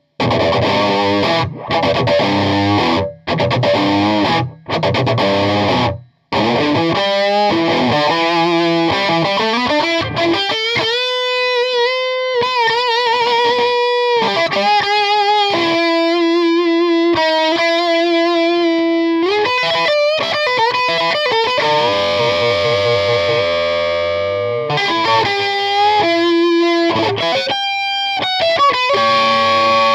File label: 335 brg